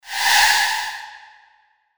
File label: hf sweep